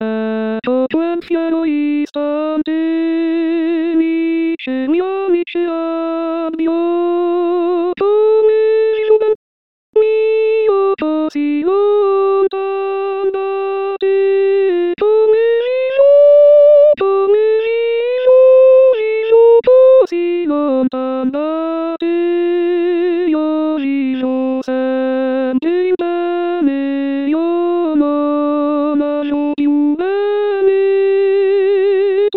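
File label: alto; Mozart; Ecco Quel Fiero Istante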